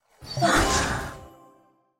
Звук комбинации карт

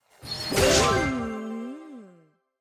Звук получения новой комбо карты

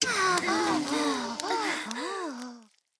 Звук начала исследования

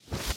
Звук переключения локаций (выход из затемнения)

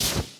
Звук переключения локаций (затемнение)

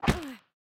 Звук удара карты о карту

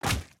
Звук удара карты об персонажа

